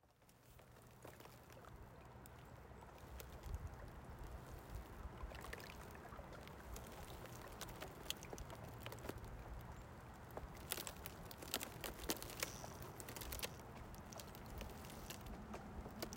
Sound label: Water moving under ice at pond; hadlock pond